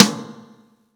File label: acoustic drums